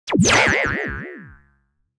bounce cartoon

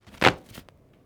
folding fan

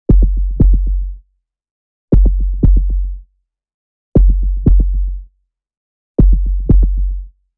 heartbeat

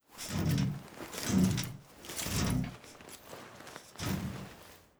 ventilating duct climb

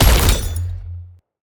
sndshotgun

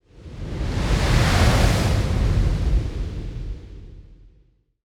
strong wind dream

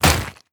car crush

